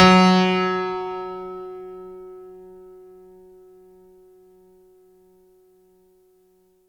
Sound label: F#2, synth; 90 s Sample CDs; NiceBeats; SynthLine VOL-26